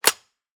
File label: mp5 charger forward